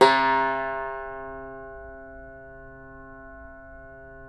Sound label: CUMBUS C, Guitars